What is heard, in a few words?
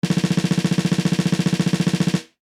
drum roll